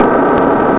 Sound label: TURBLIFT